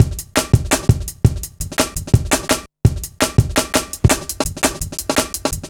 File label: Live Wire, Drum Loops, 168.5, Jungle; Zero-G Jungle Warfare 1, 2 & 3